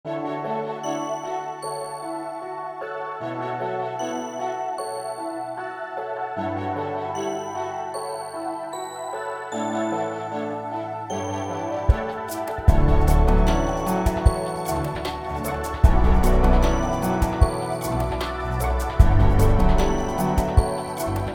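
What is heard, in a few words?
background music forest; final fantasy xv 2016; cut and unreleased tracks; platinum demo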